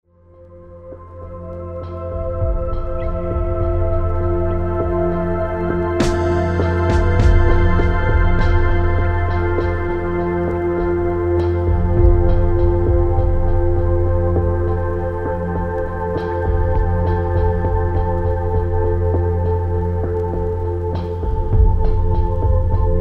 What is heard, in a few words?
My Car Is Broken I'll Walk, Drum atic Atmospheres